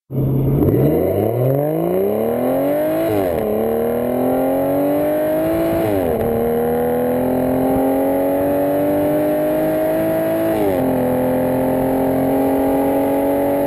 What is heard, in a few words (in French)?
Harmoniques